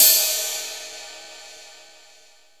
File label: brush crash, Jazz Brush